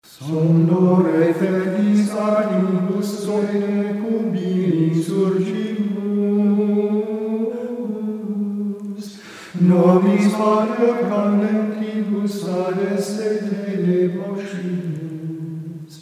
Vigil – Gesang, Gregorianische Gesänge

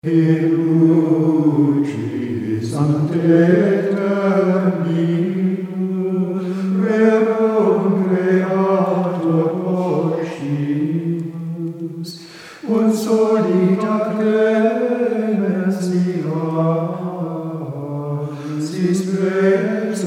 Komplet – Gesang, Gregorianische Gesänge

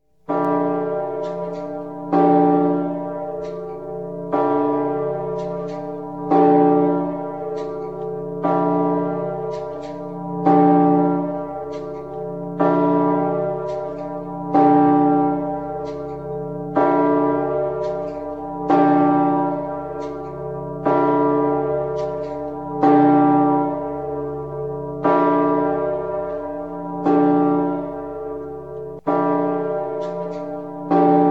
Komplet – Glocken; Kathedrale von Messina, Sizilien

